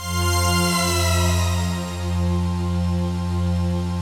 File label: ATMOPAD; Best Service; XX Large; Pads